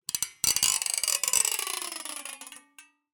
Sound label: industrial spring metal stretch scrape expand